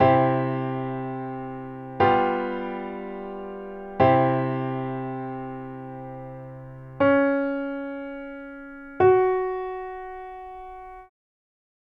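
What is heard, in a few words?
2 Note Melodic Major c#3 f#3, piano; melodic ear training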